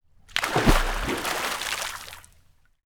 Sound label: Water; TOOLKIT vol.2